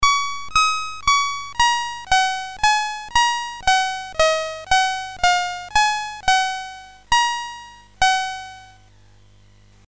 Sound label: Music Game BASIC, sid MOS6581R4; hvsc